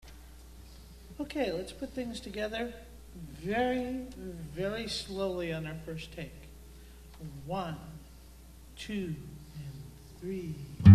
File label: FULL SOLO